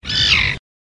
Common Tern Tearrr

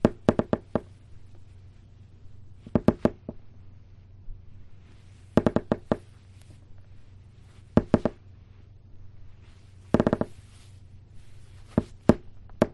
Board Creak

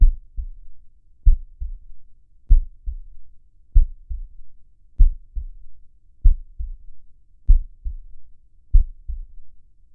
Slow HeartBeat

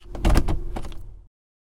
Gear Shift Into Drive

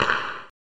Hockey Stick Slap

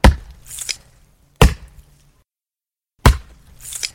Chopping Wood

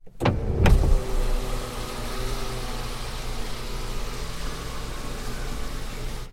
Sun Roof Open

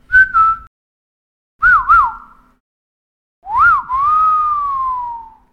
person whistling at girl

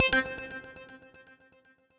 sms alert; daniel simon

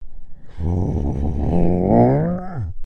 Giant Angry

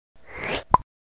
Gum Bubble Pop

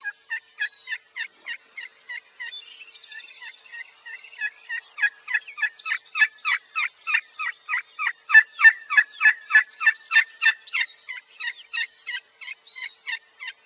Turkey Assembly Call

